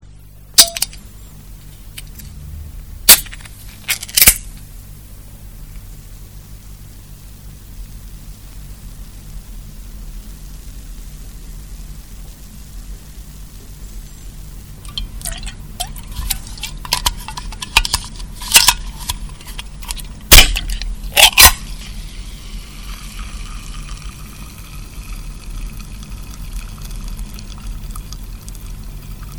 Beer Can Vairous Opening; SOUND EFFECTS1

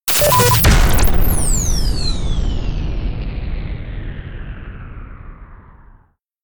impact

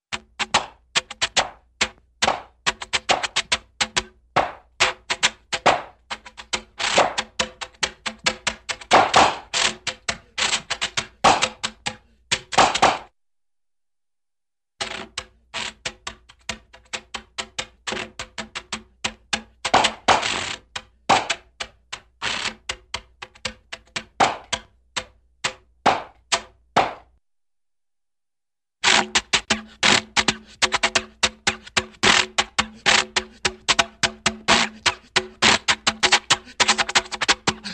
Funny Vocal Engine Steady, Strong Airy Exhales; Hollywood Edge Cartoon Trax

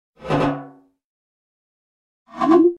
Reverse Stinger - Metal Double Whoosh x2; Hollywood Edge Sound Designers Tool Kit

